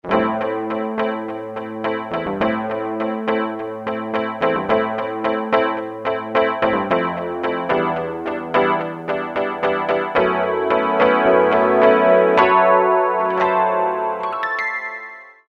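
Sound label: Detune Electric Piano, Roland U-110; Factory Patch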